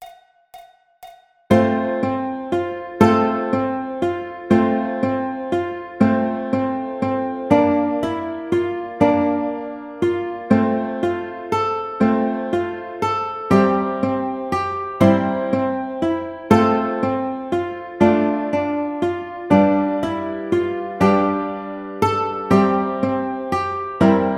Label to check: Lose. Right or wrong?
Wrong